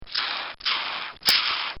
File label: Cartoon Sound Effects Crunch; Cartoon Sound Design